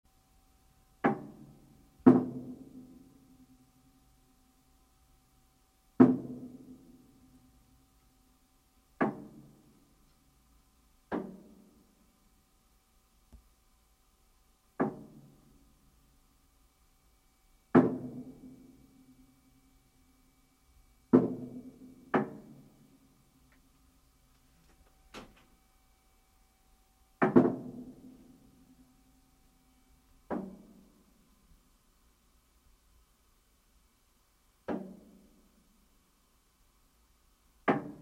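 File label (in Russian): удары в обшивку лодки; Звуки подводной лодки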